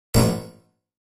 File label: Metallic Bounce